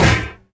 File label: physics turret impact lg